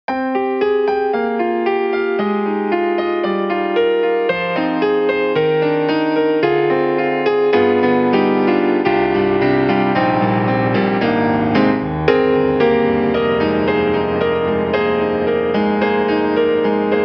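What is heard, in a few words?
YAMAHA MOTIF-RACK XS Old and Squasher, piano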